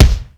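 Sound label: monster bass drum; East West; Global Sounds; HR16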